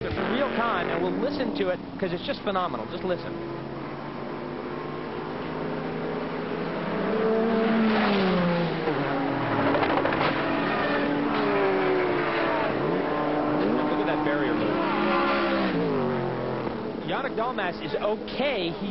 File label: Flying car